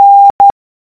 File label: N morse code